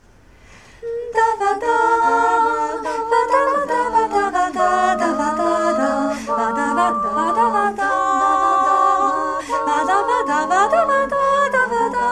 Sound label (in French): messe voix